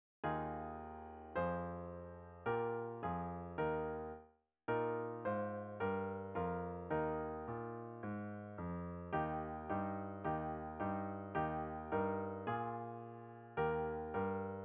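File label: Solo Four Eb Brass and Clarinet; first con 2024 stage 1 2 3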